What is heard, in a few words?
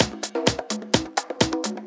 Latin Loop 128 BPM